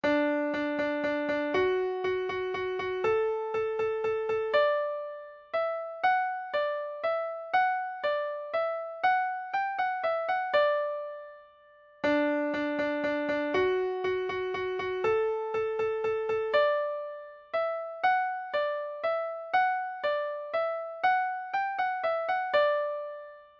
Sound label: trumpet minet, folk music midi